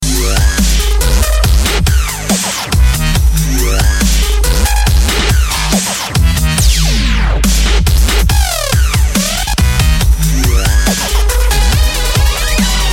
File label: party dubstep